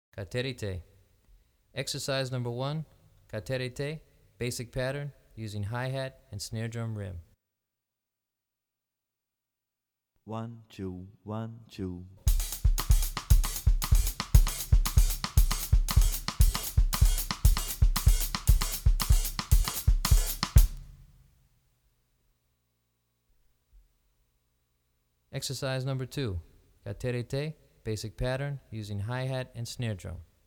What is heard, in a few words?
Caterete, Drumset; Brazilian Rhythm For Drumset; DaFonseca, Weiner